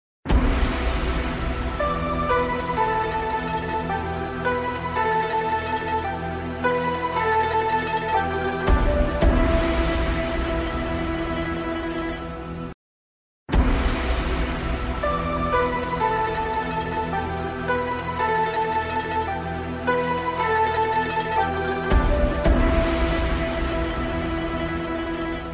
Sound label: Chinese Sms